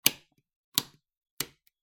壁のスイッチのカチッ音; switch

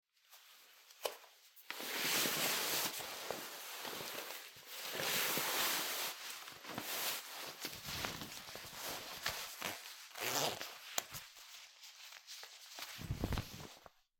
ジーパンを履く音

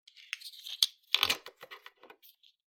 ペットボトルのキャップを外す音

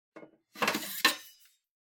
包丁を取り出す音

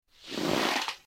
布のソファに座る音; sofa

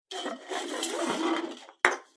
空の缶が転がる音

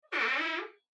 ドアがきしむ音; door

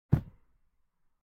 脱いだ服をシーツの置く音

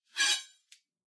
まな板にある包丁を取る音

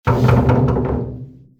和室の扉を叩く音; door

